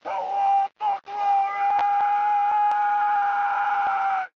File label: To War; lethal company